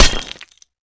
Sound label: knife stone; weapons; tknife